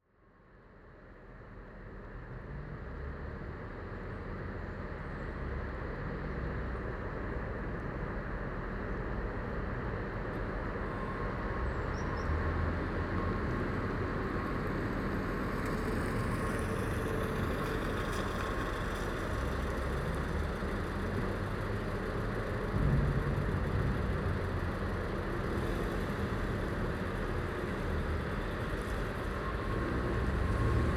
Centre compostage; les boucles de l'isère; voix d eau

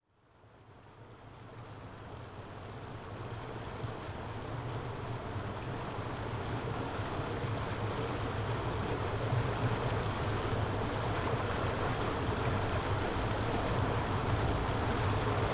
Bois Batie; les boucles de l'isère; voix d eau

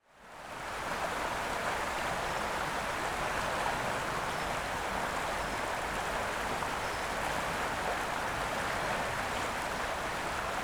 eau canal, Canal de la Romanche, le long des anciennes papeteries de Pont-de-Claix

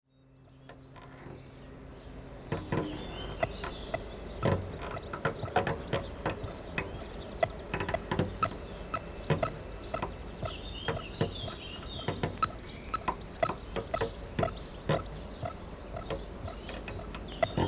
sirènes, extrait des performances improvisées; Deux pistes jouées ensemble : le violoncelliste (Alessandro De Cecco)

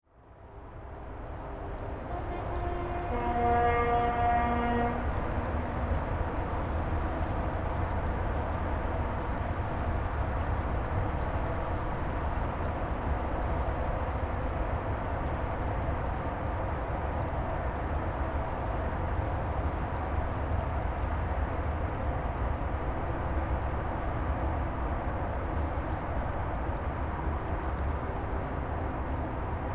clapet; les boucles de l'isère; voix d eau

